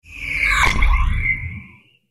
Sci Fi Weapon, Sci Fi Plasma Ray Gun Blast Impact Warble Liquid; Battle; Sci Fi Weapon Plasma Ray Gun Blast Impact Warble Liquid Science Fiction